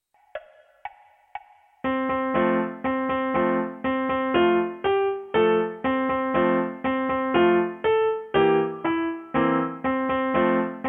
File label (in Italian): piano unite